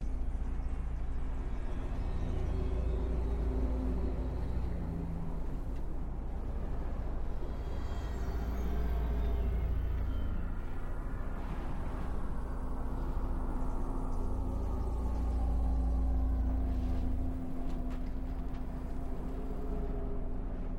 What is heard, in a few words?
Ambient; Transformers The Game; Cybertron